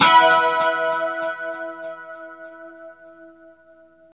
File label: 2CHD E 5 -L, Guitars; Spectrasonics; Hans Zimmer Guitars VOL-1; JC-SHEEN